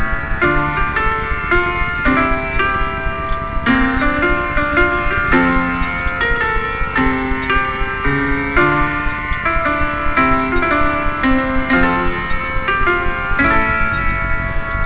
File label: piano